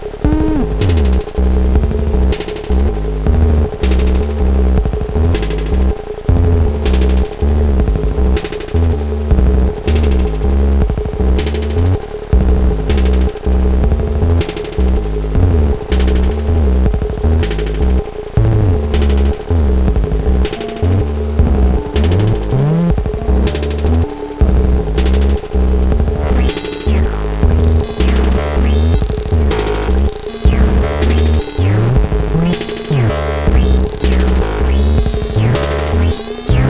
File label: Acid Machine tr808